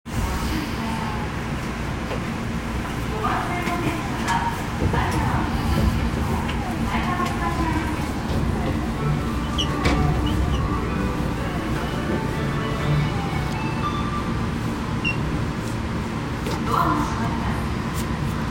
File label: train sounds; 南北線永田町北